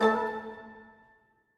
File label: Goblin Rise; King's Quest Chapter 1: A Knight to Remember